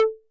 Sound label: Conga Hi 808 Saturated B